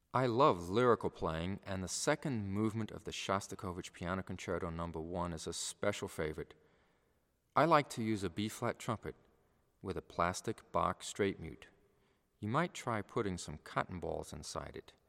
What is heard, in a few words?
Piano, Trumpet; Orchestral Excerpts For Trumpet